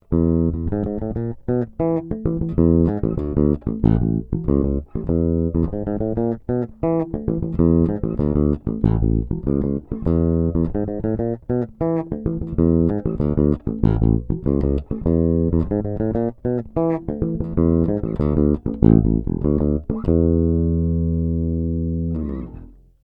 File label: Bridge finger